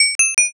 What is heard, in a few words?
pay